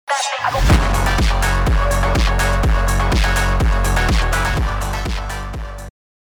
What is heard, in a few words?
phonk